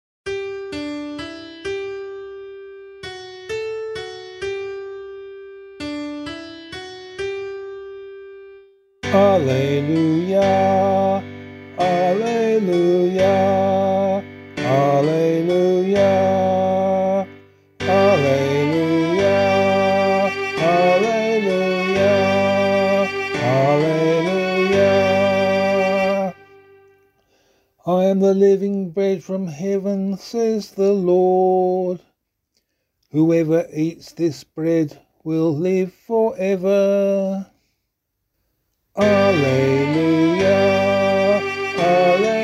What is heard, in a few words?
Gospel vocal; Gospel Acclamation for Australian Catholic liturgy